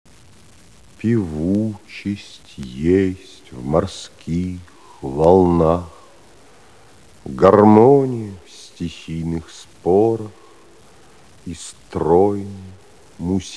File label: ocean waves